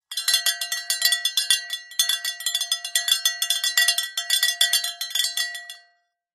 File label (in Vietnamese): am thanh cua chuong bo